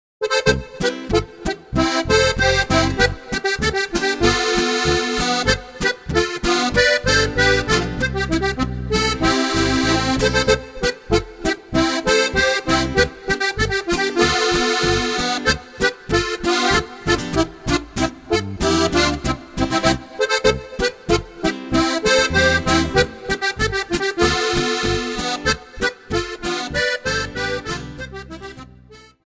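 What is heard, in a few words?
bierfassl boarischer, Steirische Harmonika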